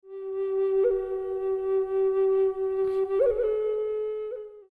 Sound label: indian, indian flute